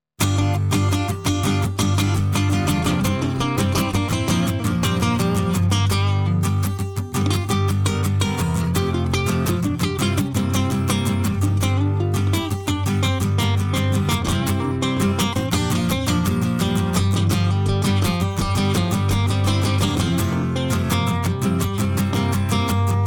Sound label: Myka Parlor Acoustic micd, guitars